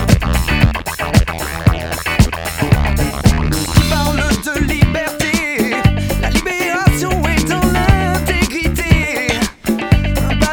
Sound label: Phat funk